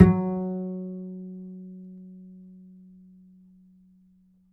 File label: Upright Acoustic Bass, 1ST POSITION; Larry Seyer